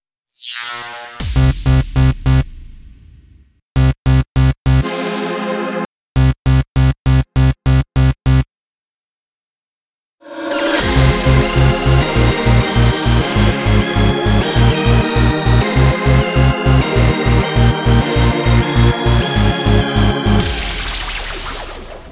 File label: bgm